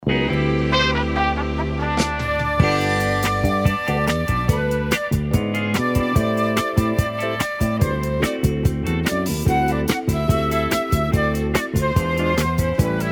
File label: christmas kit instrumental beds